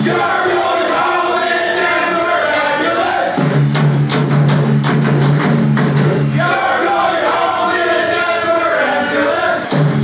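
youre going home in a denver ambulance, football chant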